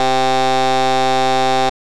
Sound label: Test Failed